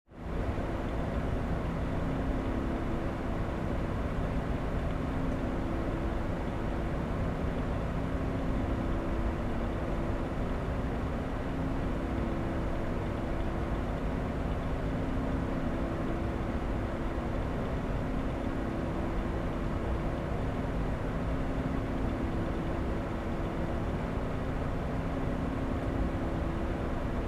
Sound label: Ventilation Noise